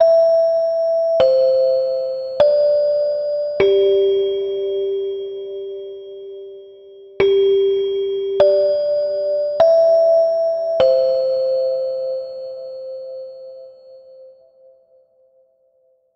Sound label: chime